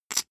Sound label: poker chip stack small rattle short